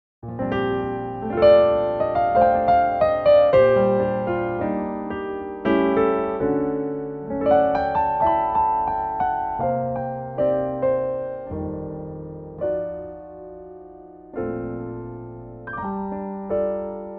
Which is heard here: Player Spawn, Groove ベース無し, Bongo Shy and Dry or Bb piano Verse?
Bb piano Verse